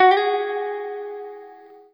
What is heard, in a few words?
Guitar & Bass; Quantum Leap; 90's Sample CDs